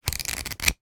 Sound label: household small coupon voucher book flicking through pages